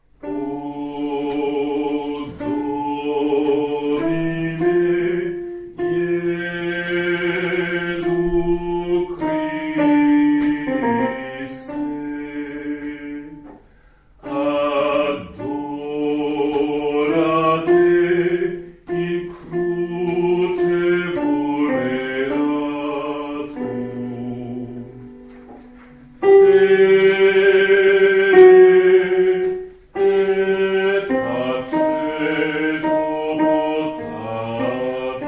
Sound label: O Domine Jesu Alti